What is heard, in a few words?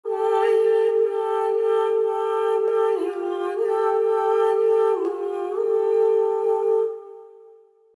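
Music Chant; Curse of Atlantis: Thorgal's Quest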